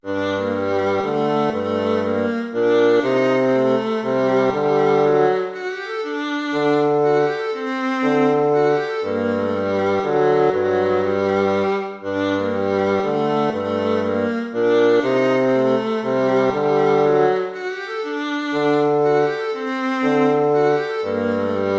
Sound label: baroque minuet minor